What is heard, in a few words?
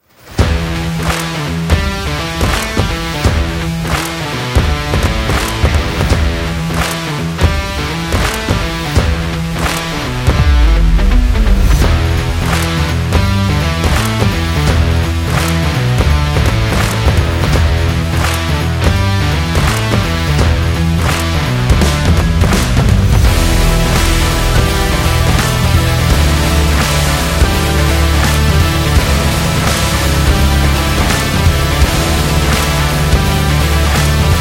Indie